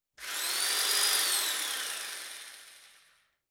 Electric Drill, Short Burst Freew; Construction; BBC Sound Effects Library

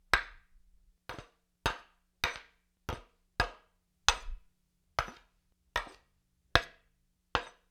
Spear Spear Blade on Haft, Weapon on Weapon; StillNorthMedia

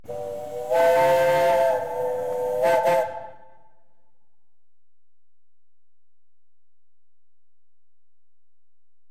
Traction Engines Single Horn; BBC Sound Effects Library; Age Of Steam

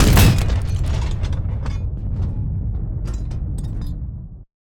howitzer shot metallic part; Bluezone; Artillery

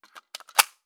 GUN G36K Mag Insert; Spectral Vibrations; Gun Mechanics UK Police

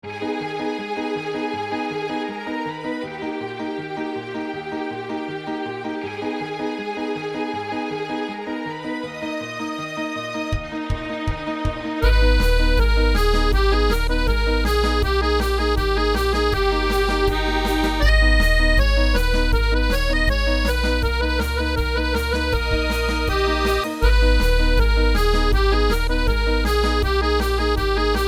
Stroll; The Frontier - RPG Set

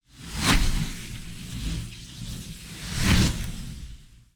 Sliding Whoosh By; Coll Anderson

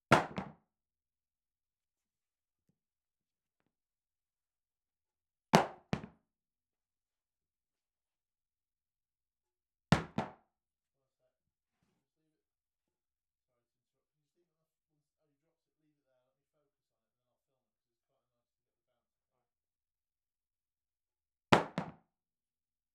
Pine Block 8x22x100cm Face Dropped 100cm Onto MDF; Beyerdynamic TG137c; Medium; Gibson and Ansell; Got Wood

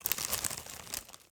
take many popcorn from bag

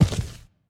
Footsteps Ground Civilian Walk; Levan Nadashvili

